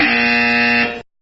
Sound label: Fail sound effect